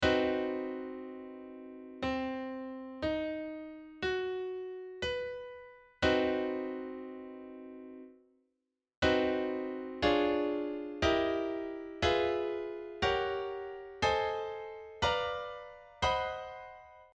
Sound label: dim major seventh